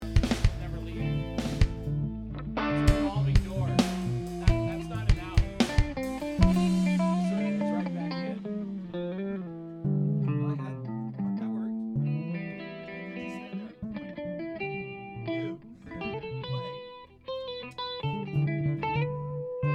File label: Vocals